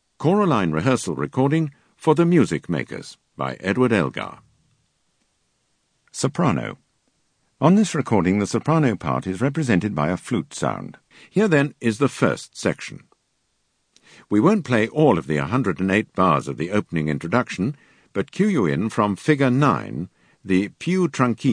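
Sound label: Soprano; Elgar Music Makers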